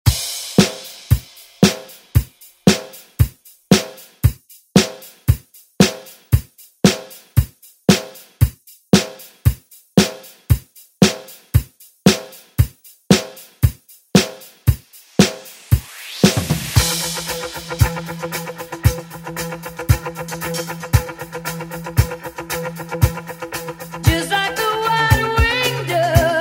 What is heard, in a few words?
80s ReDrum; DJ Mhark